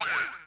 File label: escape; nsmb; bowser jr